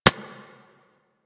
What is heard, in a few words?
Bullet Impact Generic; Surface